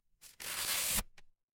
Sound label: paper fabric tear